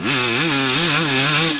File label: Mid, Engine